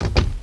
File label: door